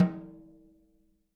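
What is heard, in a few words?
jazz snares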